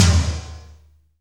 TOM FUNK; Roland LA; DRM Toms

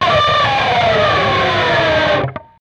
PRS SCRAP, Guitars; PRS SCRAPES

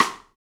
Folk Stick, Roland BK-5 Rimshots

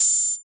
Synth Hat Open; Drum & Bass

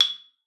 G#6, xylophone; Xsample VOL-4

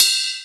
Ride bell; Multisamples; Digital Sound Factory

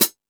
Metal Drums, E-MU Pro-Cussion; drum machine

